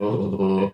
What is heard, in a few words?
Orangutan; Ed Edd n Eddy; Adromulus Pack